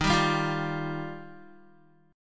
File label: Eb Major Add 9th, Guitar, Ebadd9 chord; Ebadd9 strummed; 10,10,7,10,7,x; 4F,4A,5C,5G,5C,6E; JGuitar